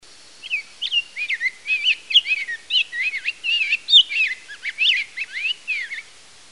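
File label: rose-breasted grosbeak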